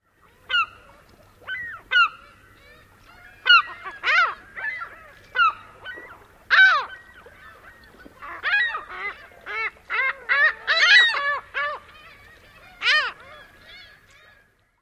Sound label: calls